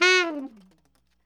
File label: TNR SHFL F#4, saxophones, SHORT FALLS; Greytsounds - Philip Wolfe David Crozier's Saxophones